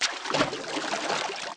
SWIM, moves; ageofwonder

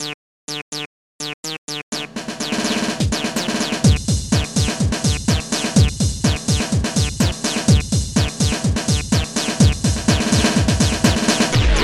hip hop; Aminet 21; GTI - Schatztruhe; Protracker Module; Protracker M.K.; techno

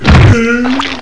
BIG HIT; Carmageddon Demo

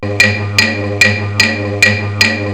sticks, oceania

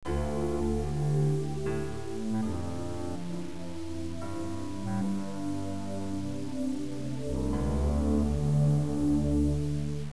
loop; Music Toys; kbot

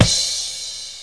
battle; batword

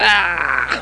gurgling; Leprechaun Demo

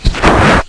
slide; Fastball Fractions

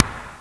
shooting far; Bolo

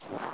snow; The Sum of All Fears

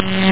Buzzz; CyberDrone

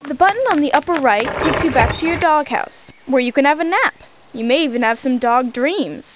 dog Dreams; PAWS

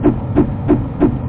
VENTIL; CD Action 27a

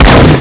shot, weapons; matrixhl

